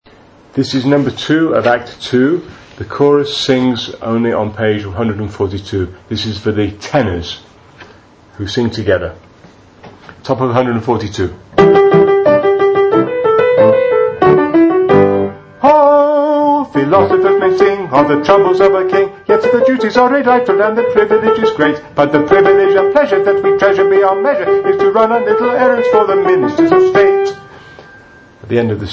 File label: 1st 2nd tenor